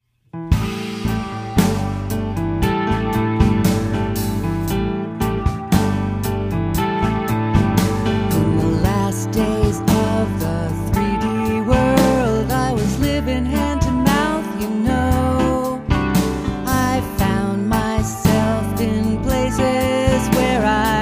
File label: blues